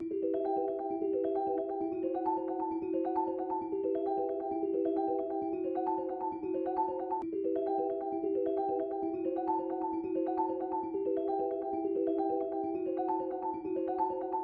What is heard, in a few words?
133 BPM E Min Bells; Loop Stems; Cymatics; 2022 Melody Collection Platinum Expansion - Pt 1